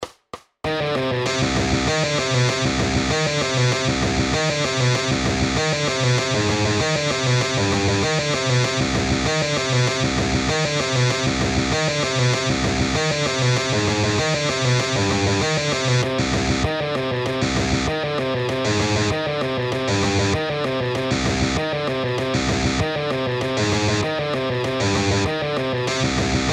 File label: Tooth quota middle riff